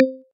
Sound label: dialog; Golden Crayon Sounds